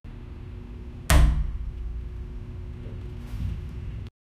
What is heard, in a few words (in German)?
raumklang, environment